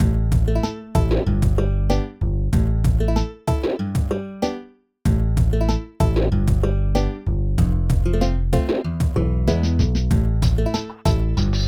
BPM100; Scribblenauts

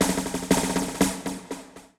Badge Upgrade; This Is the Police

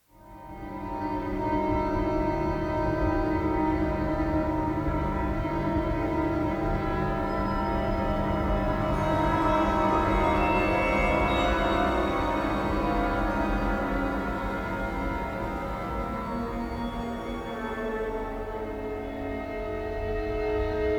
Mood Nazi Creepy

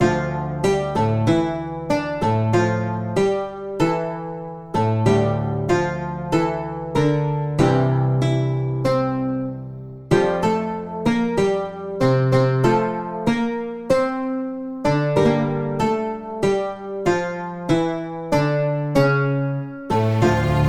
Flute Death Music; The Pains In My Rear; Tinklages Shit Flute Death Music; Postal 4 No Regerts

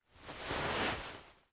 Rubbing; Still Life; windows

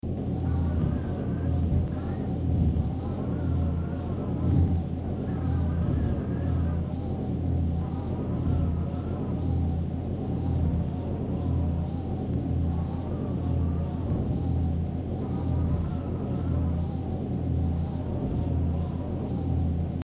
Ambience; Kolibri; 32x

